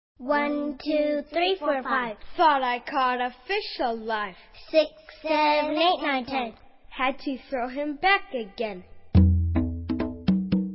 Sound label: Jig; Morrison's Jig